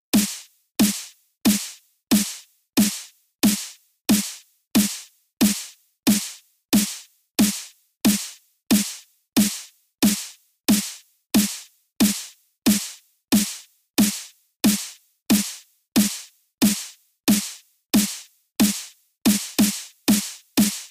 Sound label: snare